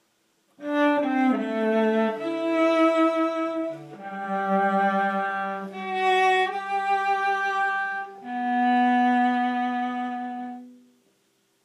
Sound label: Cello